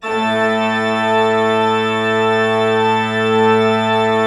A2, cathedral organ; Propeller Island

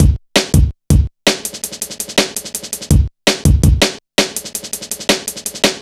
165, Drum Loops, Drum & Bass; Total Drum&Bass

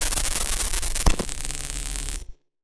Casio, Glitched, malfunctioning synth

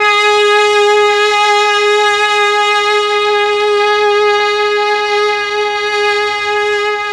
MEL STR, Legendary M400; ILIO; Propeller Island

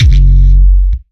kick gabber

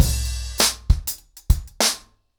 Drumkit 100BPM, ACOUSTIC DRUMS

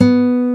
GUITAR B2; Proteus3

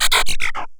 Sci fi

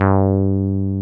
303 basic G3; Drums In Your FACE!

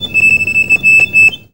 ACC TRILLER; Best Service; World Colours

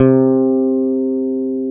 FEND P-BASS C3; Sonic Reality - Vintage Timetraveler

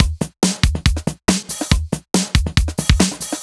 Break Beats, 140 BPM

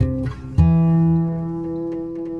Bendo Zed Gtr, Guitar Only; Sonic Foundry (Sony Creative Software); Friendly Fire

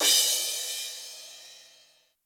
Crash, Yamaha RY20

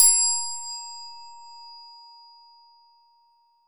B6 filt, temple bells; SoundBytes - Early Patches

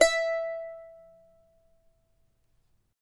charango E4; Spectrasonics; Hans Zimmer Guitars VOL-2

